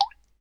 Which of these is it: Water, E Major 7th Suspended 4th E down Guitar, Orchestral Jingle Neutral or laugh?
Water